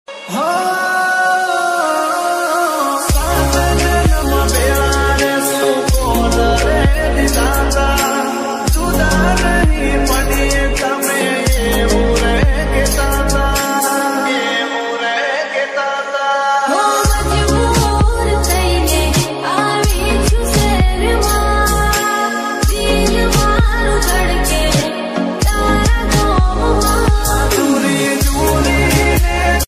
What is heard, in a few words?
gujarati ringtone